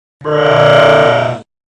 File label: multiple acceleration sound